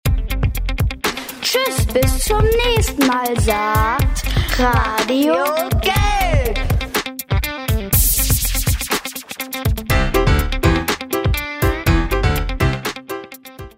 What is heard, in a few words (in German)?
Jingle Ende; RadioGElb 2016